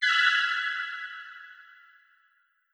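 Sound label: Goddess Sceptre Light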